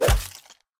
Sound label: wall